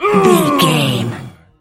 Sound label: voice male battle shout short